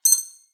Single UI Beep

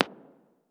Echoing Pop

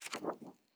Rustling Paper Page Turn

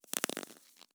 Rubbery Rustle

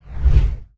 Swoosh Transition

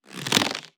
Elastic Rubber Creak

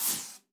Spray Hiss

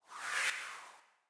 Sci-fi Swoosh

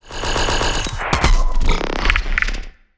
Sci-fi Malfunction Rumble

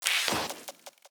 Sci-fi Energy Gun Blast

